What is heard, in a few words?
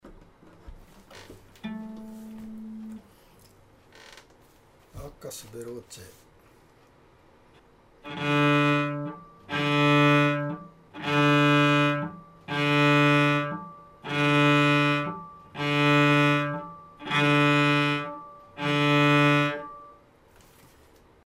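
Arcus veloce staccato, cello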